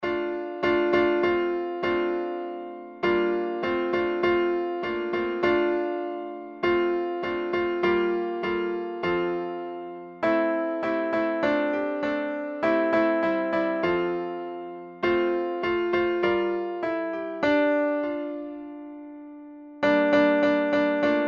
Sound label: Tenor, midi; 天主經